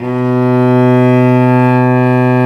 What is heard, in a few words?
STR Vc Arco p